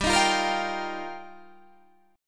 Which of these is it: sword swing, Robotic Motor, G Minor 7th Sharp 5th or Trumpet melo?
G Minor 7th Sharp 5th